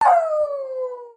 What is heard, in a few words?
smol howl